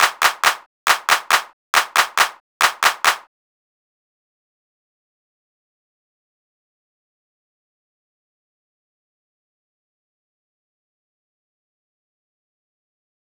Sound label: clap